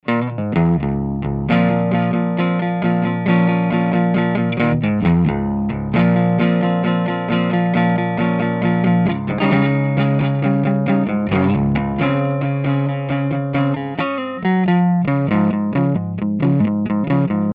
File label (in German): Klira Pinguin